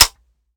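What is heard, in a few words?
akilo47 fire first player, weapons; viper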